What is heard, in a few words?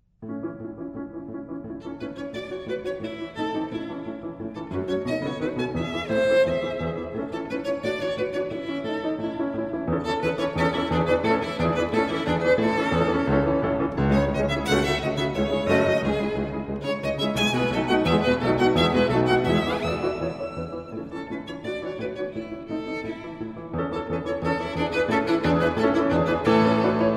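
violin sonata; godard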